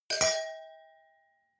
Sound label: wine glass different clink bounce dull medium, gastronomie; berufe; soundpool